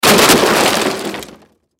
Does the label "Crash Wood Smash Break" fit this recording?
Yes